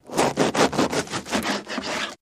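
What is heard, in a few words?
Cut Slice Bread Single; utensils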